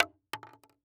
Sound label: Weapon Bullet Shell Shotgun Shells Fall On Boardwalk; AdobeCC